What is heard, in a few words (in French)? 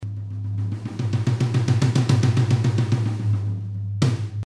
tom vélocité; toontrack; ezdrummer